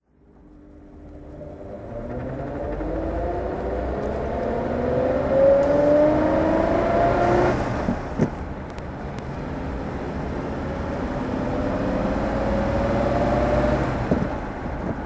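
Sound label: rolls royce wraith driving, engine sound driving; V3D/ ClassicarImages; Marc Vorgers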